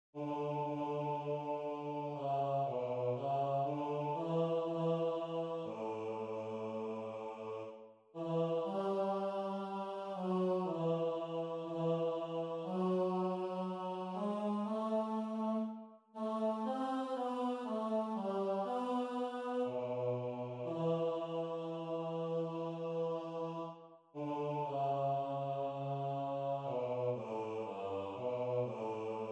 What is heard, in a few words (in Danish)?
bas; korsats